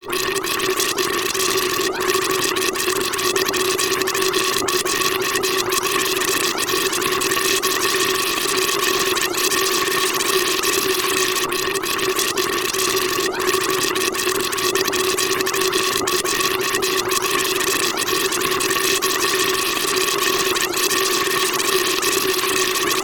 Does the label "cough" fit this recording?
No